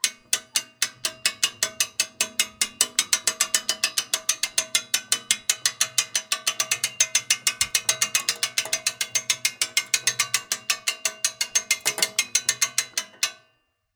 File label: crank Slow CHNK CHNK CHUNK